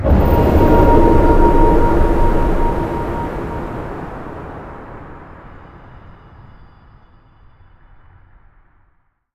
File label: end portal; Minecraft; Conquest Default